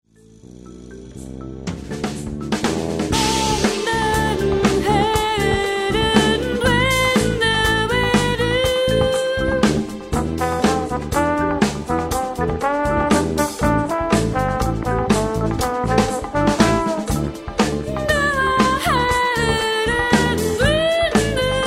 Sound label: minimal bolero live